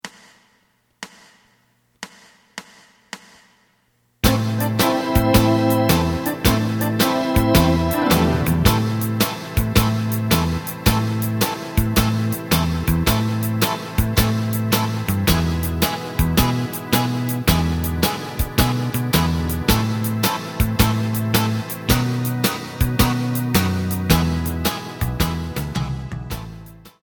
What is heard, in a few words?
lead